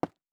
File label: Footstep Concrete Running